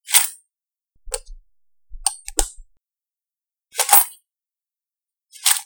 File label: equipment clicks